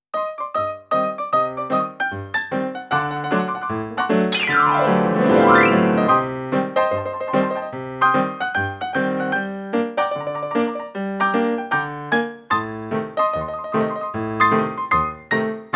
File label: piano, Ragtime